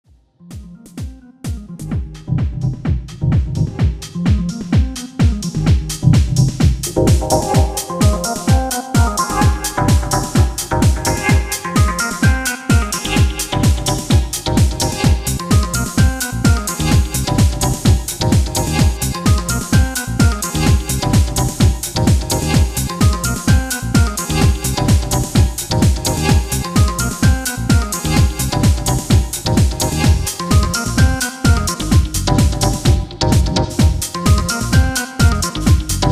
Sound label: It rocks the Organ